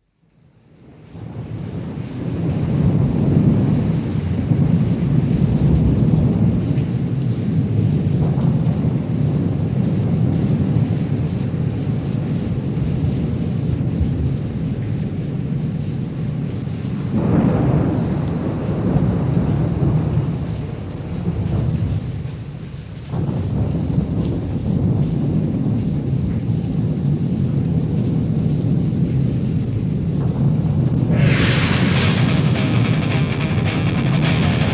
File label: Heavy Metal Thunder